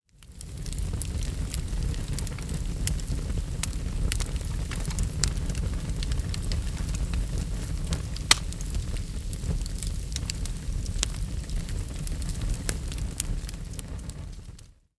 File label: Fire Wood; blue archive